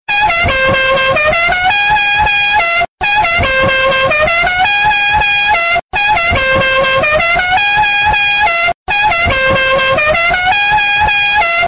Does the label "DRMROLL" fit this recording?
No